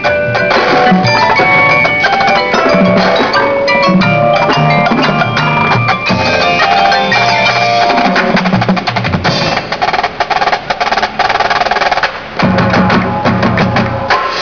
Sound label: Day Danse- The above clip with ensemble (pit).; Blue Devils